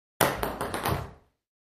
Ping Pong Bouncing

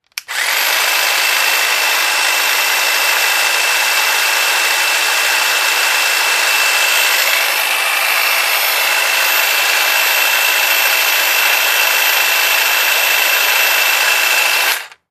Hedge Trimmer: Small Motor Start And Steady.; Download / HedgeTrimmerSmall

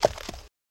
Horse Hoof Step On Gra

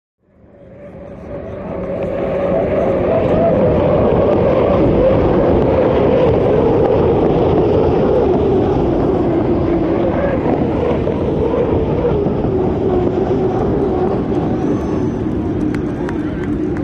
Hydroplane By Cheer

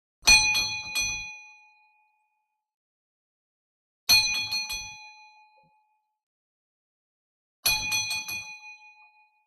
Doorbell; Household Doorbell

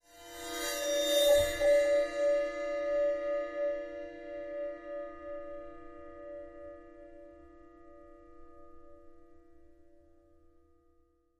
Cymbal Bell Bowed; Cymbol 6 Inch Splash Hit 1

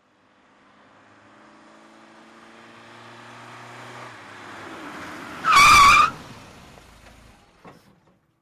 Bmw 530i Fast W skiddi

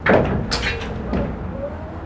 Elevator Door Clank

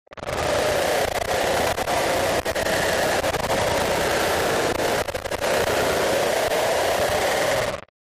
Distort Chopping Dro, distortion

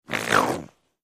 Violent Cat Snarl; animals cat

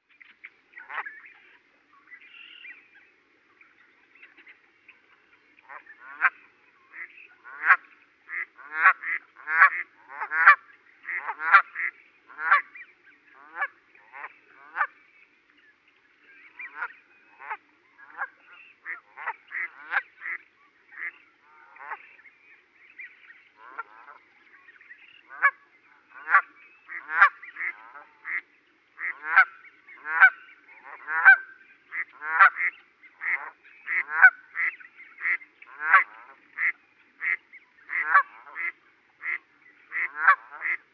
Goose Canada Honks Go, goose

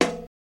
Bingo Tap Single

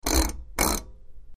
Metal Dial Turn Click

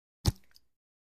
Water Bottle Lid Ope

